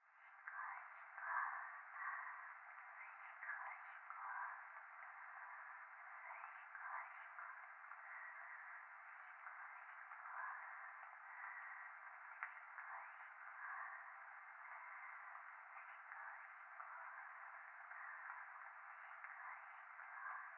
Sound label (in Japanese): 風呂 しこしこ 雨